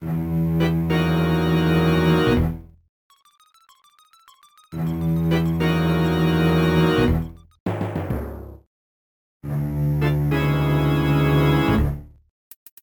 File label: Fortress Theme; New Super Mario Bros.